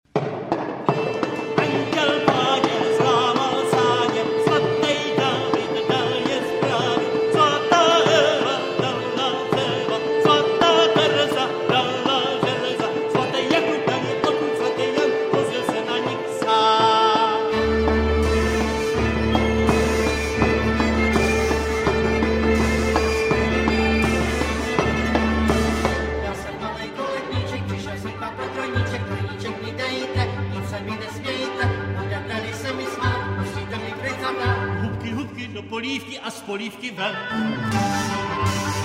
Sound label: hled male jezulatko